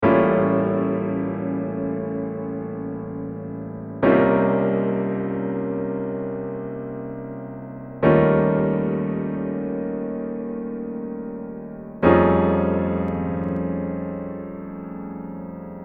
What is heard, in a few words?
piano